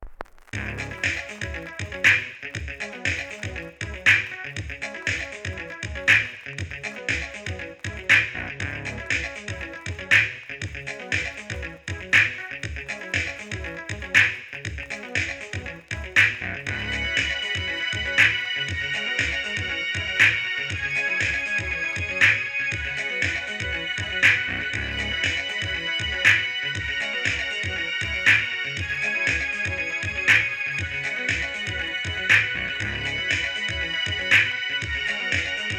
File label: music box; Morytanian